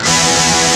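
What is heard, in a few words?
Barchord, guitar; Best Service Gigapack 2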